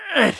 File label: armed villager damage